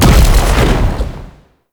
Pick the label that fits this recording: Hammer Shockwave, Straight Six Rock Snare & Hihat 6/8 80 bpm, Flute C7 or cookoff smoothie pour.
Hammer Shockwave